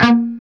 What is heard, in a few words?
CHUG A#2, Guitar; CHUGS; East West; Steve Stevens Guitar Samples Collection